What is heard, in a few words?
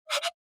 Bicycle Horn